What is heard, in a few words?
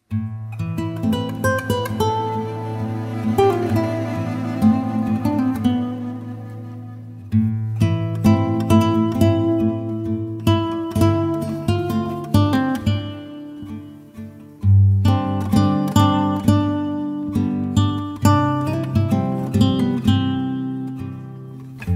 Peaceful Guitar